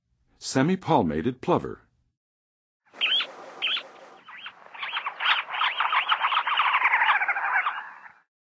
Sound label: Semipalmated Plover, Birds of North America; Western USA; Western USA Songbirds